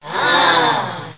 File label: ahh; castle